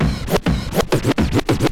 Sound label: SCRATCHES